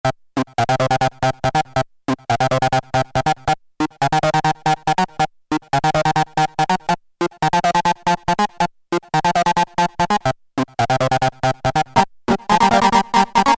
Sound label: Fuzz Lp, Yamaha A4000 Syntraxx Loops